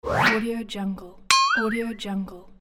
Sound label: cartoon transition